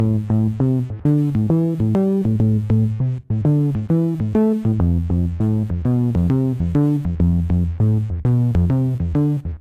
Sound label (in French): Sound Prom Basse; Remember Me